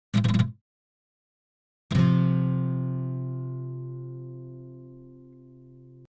Gt F muted

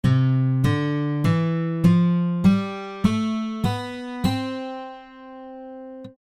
Gt do re mi

